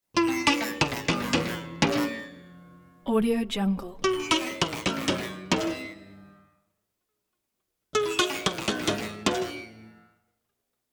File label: lute out of tune guitar metal string twangs; prosoundfx